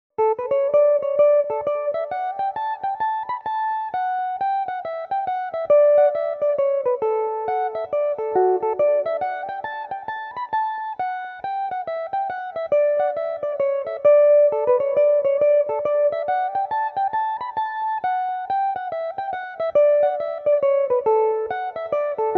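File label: hornpipe d major, mandolin; Gan Ainm 24; Hornpipe (4/4); Belmuse EM-190 electric mandolin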